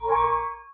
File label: hover move